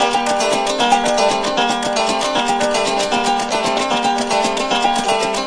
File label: 154 zither rhythm; WORLD LOOPS